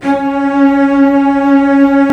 Cellos; CMI

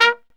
Brass Stab; CMI

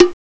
BONG HI, CMI

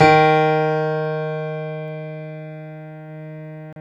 Piano Grand; CMI

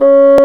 Bassoon; CMI

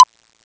BLOCK, CMI

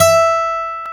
Gtr Acc; CMI

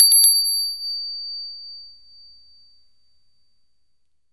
Bell Finger; CMI

